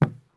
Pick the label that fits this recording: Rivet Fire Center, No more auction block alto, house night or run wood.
run wood